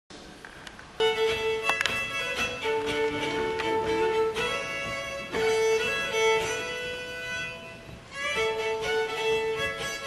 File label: AmericanFiddle